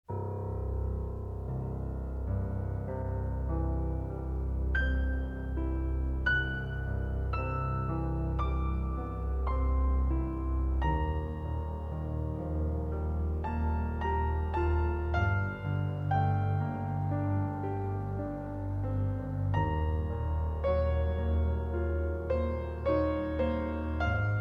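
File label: Shenandoah Piano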